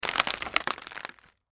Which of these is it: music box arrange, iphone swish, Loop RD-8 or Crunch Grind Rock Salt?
Crunch Grind Rock Salt